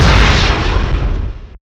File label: rocket fire, weapons